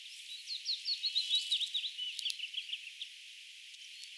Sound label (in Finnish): pajulinnun säe